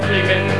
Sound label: screamin